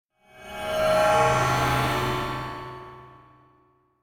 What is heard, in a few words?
Metallic Reverse Hit Impact; Mystic; Hollywood Edge; sound designer's toolkit v2